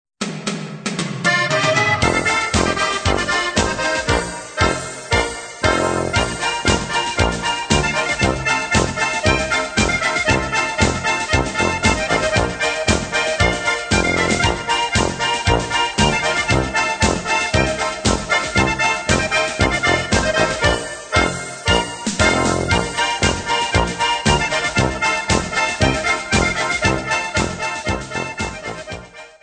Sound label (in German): zillertaler hochzeitsmarsch